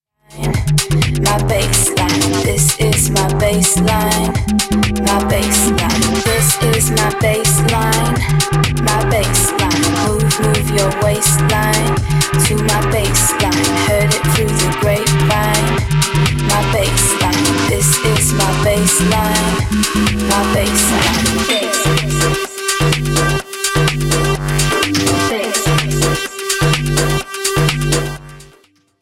bassline

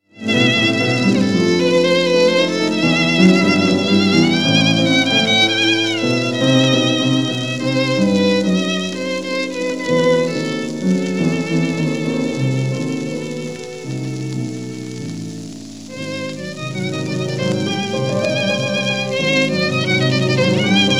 hebrew melody op33 vers for violin and piano

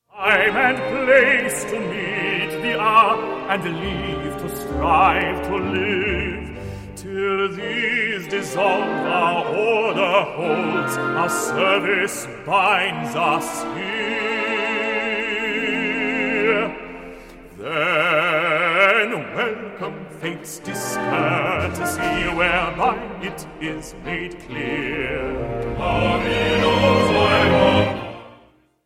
for 4 baritones and orchestra; Fringes of the Fleet; text by Kipling

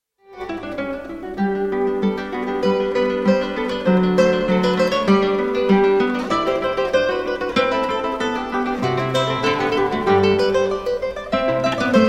duo in G major for guitars; ferdinando carulli; op 34 2; 2nd mvt

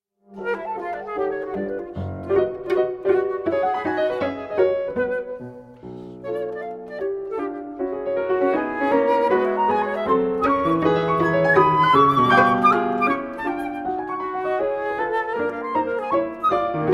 sonata da camera for flute cello piano; gabriel piern; london conchord ensemble

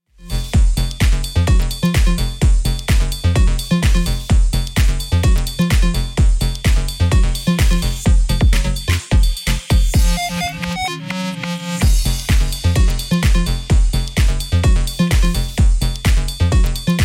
cowbell

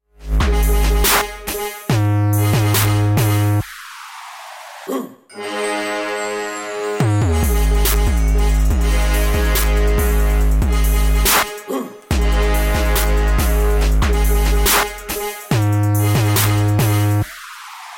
chimes

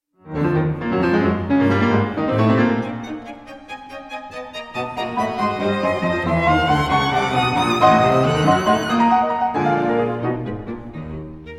piano quintet in E flat minor; hummel; op 87

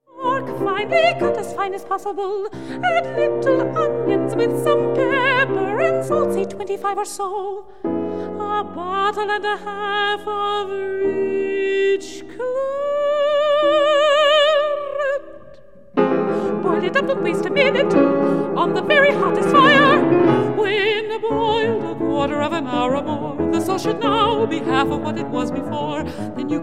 song cycle for soprano and piano; no 4 civet a toute vitesse

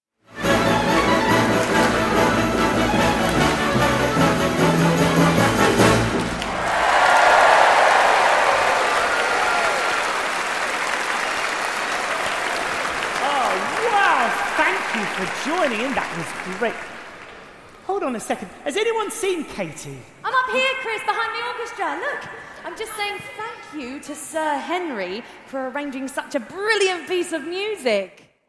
fantasia on british sea songs hornpipe; sir henry wood

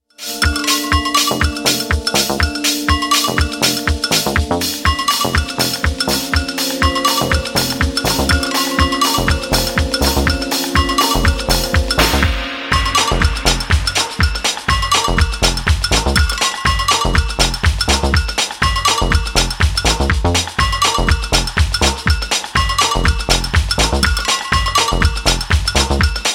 reggae drums

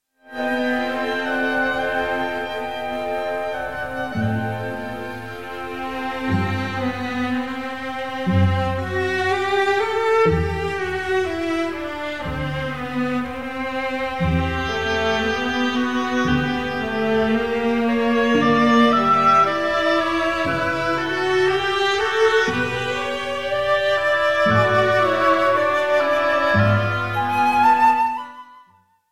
fugual concerto for flute oboe and strings; gustav holst; op 40 no 2

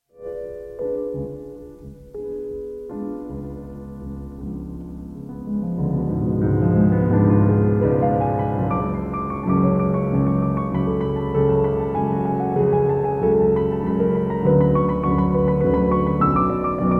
piano sonata; ludwig van beethoven; op 106 hammerklavier

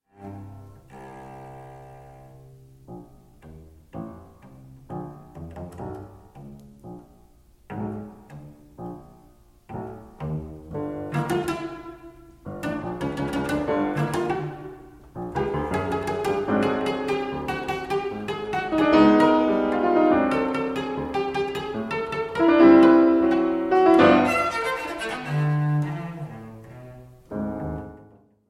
sonata for cello and piano; debussy

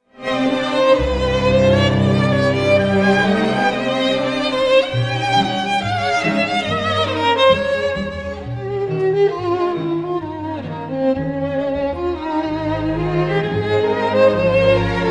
pieces for violin and piano; souvenir d'un lieu cher; 3 pieces op 42

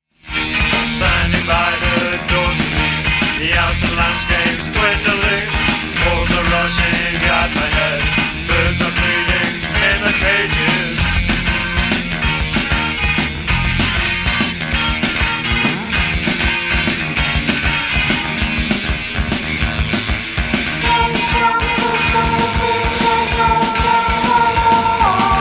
choir

